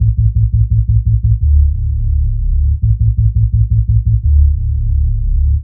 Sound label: Hum Bass; Fluid Dynamics